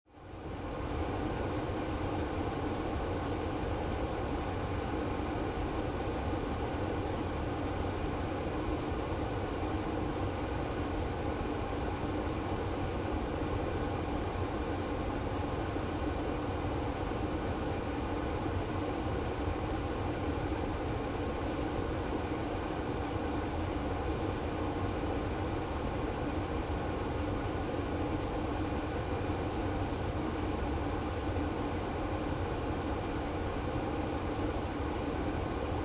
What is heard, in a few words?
Car Interior AC Running High Speed, Car Interior, 1996 Acura Integra, AC Or Heater Running At 04 High Speed, No Engine